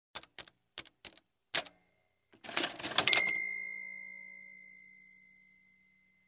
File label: Cash Register entering and open